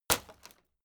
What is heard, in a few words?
impact sweetener wood shatter soft